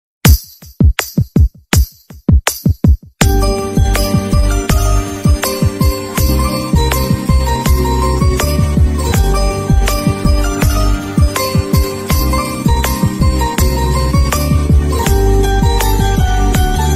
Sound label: bgm song